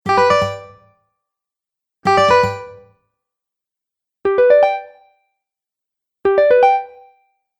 Game Bonus Ascending Strum Collection, Video Game Sound Effects